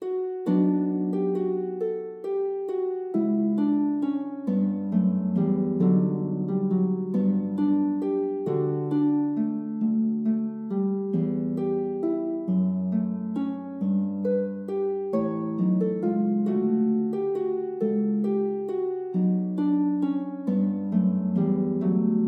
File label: courante, lute